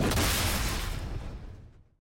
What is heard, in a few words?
skill finisher; sett